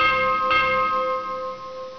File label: SHIP BELL